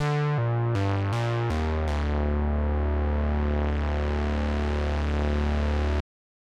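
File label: Musical Loops 120 D major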